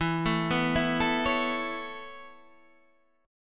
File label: C sharp Minor 7th Sharp 5th E